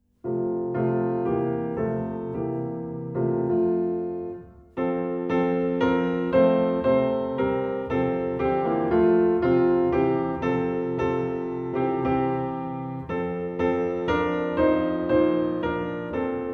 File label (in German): Ode Klavier; Mit Beethoven durch das Jahr